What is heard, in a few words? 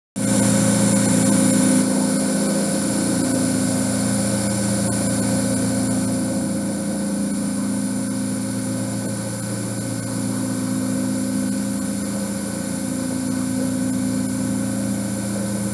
pinch valve leak N2